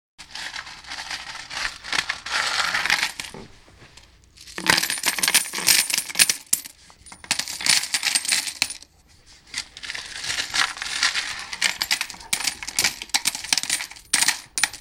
Coins dropping

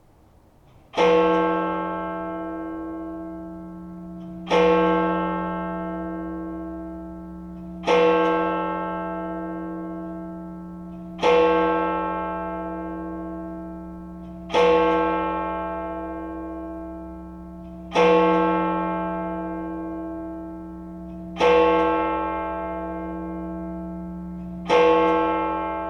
Midnight Church Bells; Frederiksborg Castle

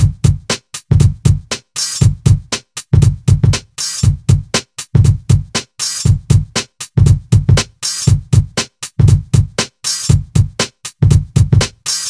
Hip Hop Beat; Zin

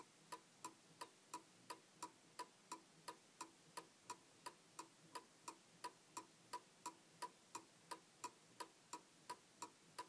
Tick Tock Clock

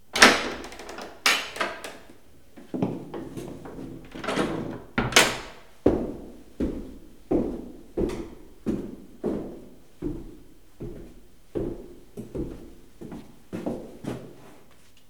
Wooden Door Steps Porte En Bois Pas

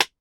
Car Interior Impulse Response

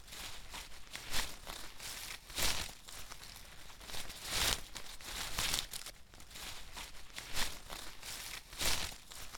Leaf Rustle

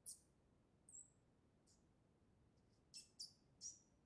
Mice Squeaks

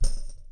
Toy tamborine hit

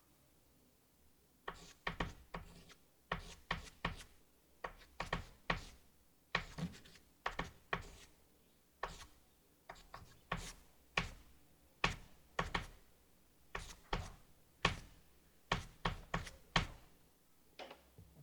Chalk Writing Slow

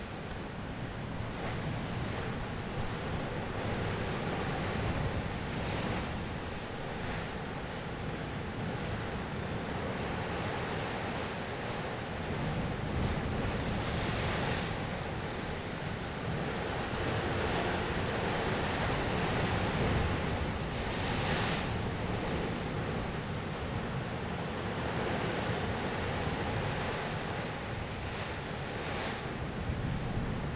Yacht Driving Through The Water; In Dubai

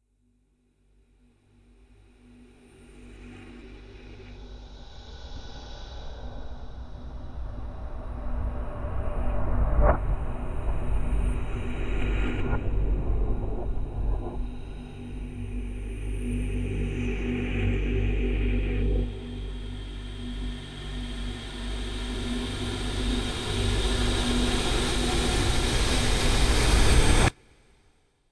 Horror Build Up; Ghost In The Machine

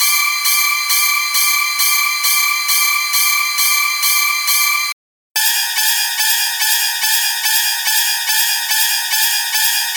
Dramatic Violin Stab Long Decay; Remix Of 166634 Timbre Remix Of 76288 Timbre

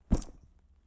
Leather Bag Drop

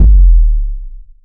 Bass Drum Clean Bent

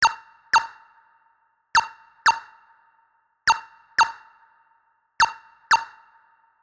Ping Ping

